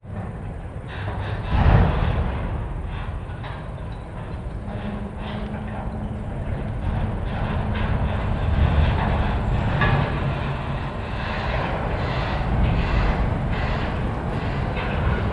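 ambience port cranes unloading cargo loud impacts night crickets in background; sonniss gdc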